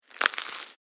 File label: Crusty bread ripping with hands; cooking